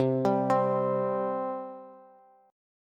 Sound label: C 5th C down Bass; C5 arpeggiated